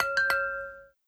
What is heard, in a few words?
Wine Glass Medium